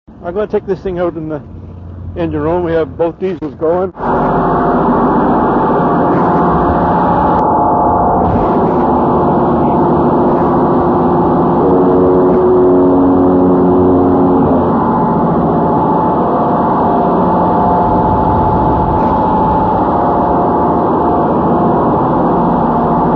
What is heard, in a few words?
diesels and Diaphone horn